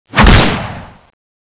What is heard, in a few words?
gunkata skill